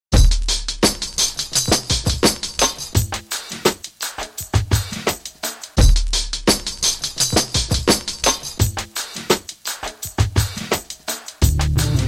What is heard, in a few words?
Jazzy